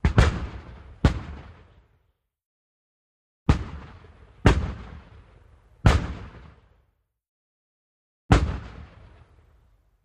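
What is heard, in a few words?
Mortars Firing Distant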